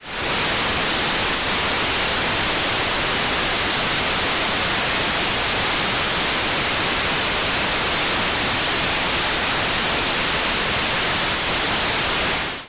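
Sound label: QBEE, satellite; AIRSPY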